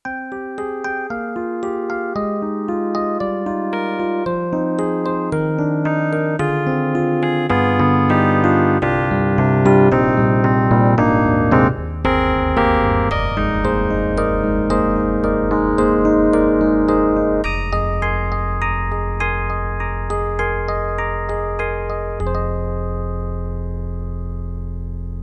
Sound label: Yamaha MU1000EX E Piano 1